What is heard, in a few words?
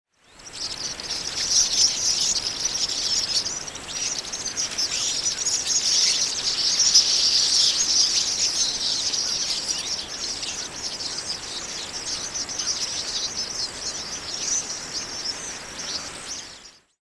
swallow sound